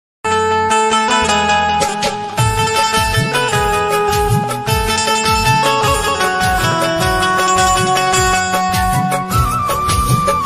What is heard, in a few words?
band baja ringtone, desi band baja ringtone, rajasthani band baja ringtone, sad ringtone